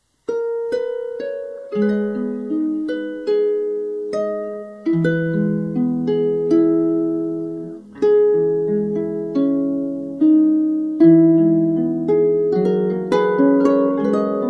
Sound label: psalm23 instrumental